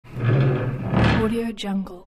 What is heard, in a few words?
move chair; debol757